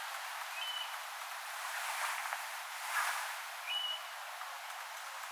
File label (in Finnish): ilm kapustarintalinnun hiukan tundrakurmitsamaisia aania melkein kuin tundrakurmitsan lent